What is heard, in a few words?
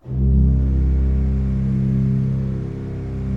C1, Cathedral Organ; Propeller Island